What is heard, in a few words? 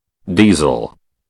diesel